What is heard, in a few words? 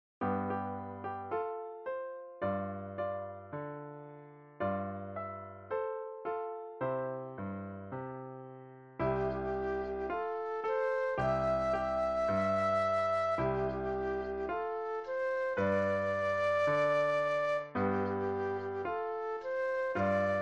flute solo